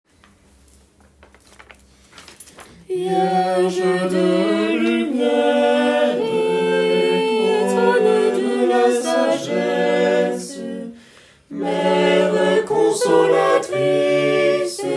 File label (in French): Chant du Jubile couplet TUTTI; Couplet 1 - tuti